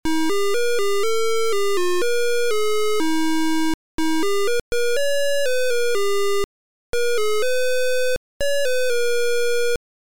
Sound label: MELODY